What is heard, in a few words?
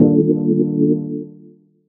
Bmaj7, Chords; Electronisounds